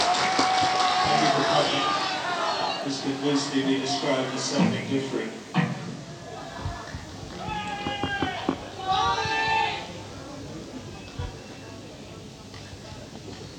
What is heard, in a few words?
Blues